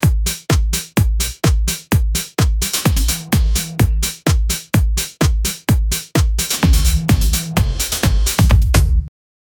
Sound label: nepali ethnic drums